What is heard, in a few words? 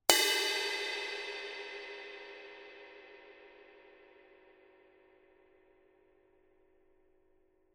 masters 19 extra thin bell, paiste